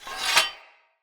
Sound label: Great Sword Unequip; Weapons; Swords; Dendrit Great Sword